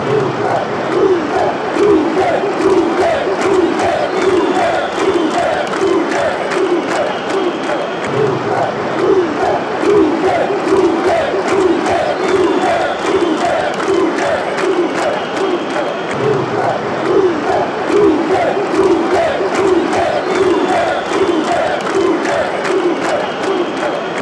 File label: croatia chant